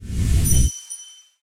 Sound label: Dream Nail Short Charge; Hero